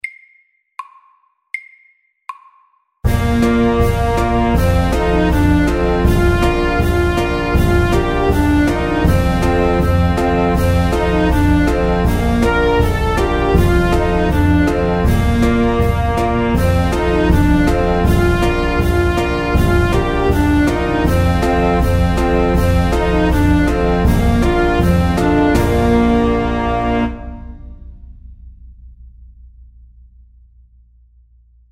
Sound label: Cancan SLOW 80